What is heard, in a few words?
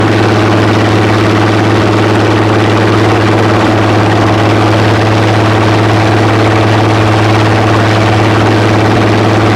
engine high, stuka; gmod; lvs planes